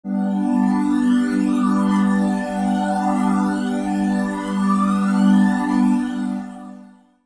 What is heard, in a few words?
Digi Pads; Jungle Frenzy 1